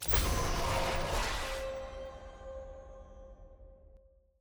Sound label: ui button click; lol; thresh